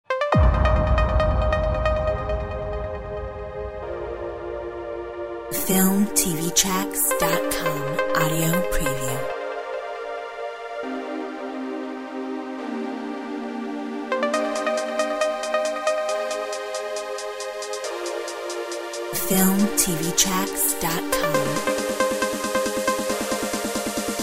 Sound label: epic trance short